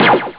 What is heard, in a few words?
shot; Amiga Soundtracker Sample Packs